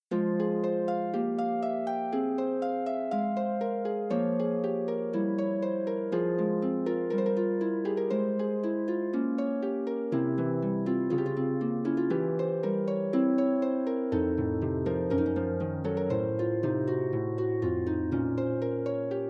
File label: prelude, Harp